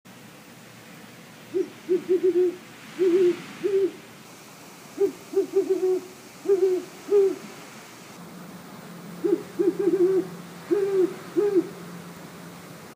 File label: Owl